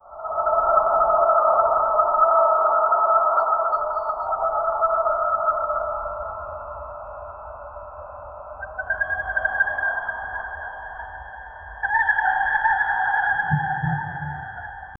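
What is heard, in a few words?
ambient sounds of a jungle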